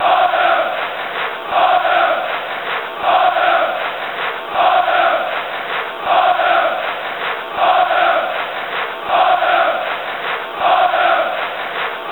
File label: crowd